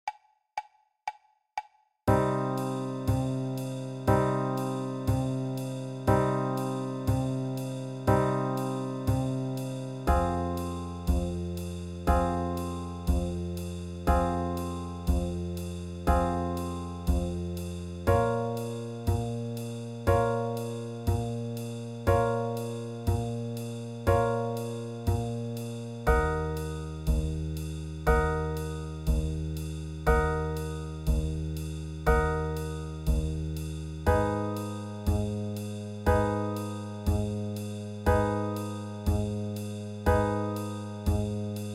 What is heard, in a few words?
minor melody play along